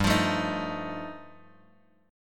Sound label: G 7th Suspended 2nd Sharp 5th G chord, Guitar DADGAD